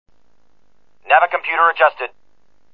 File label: hover tank navicomputer